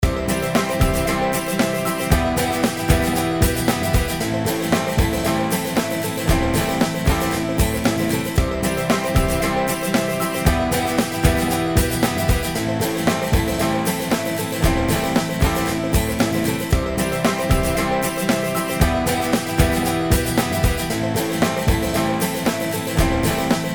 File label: banging banjo